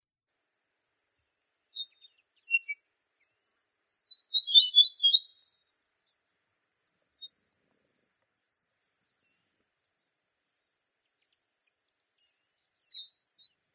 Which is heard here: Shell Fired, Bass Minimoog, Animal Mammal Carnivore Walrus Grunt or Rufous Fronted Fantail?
Rufous Fronted Fantail